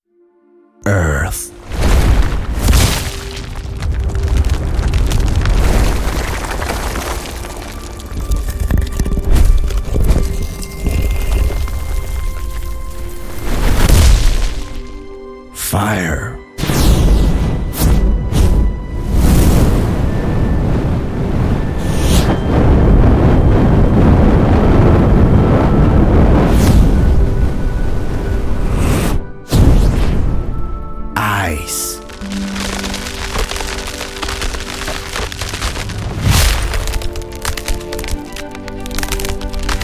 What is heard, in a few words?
Magic Elements; Articulated Sounds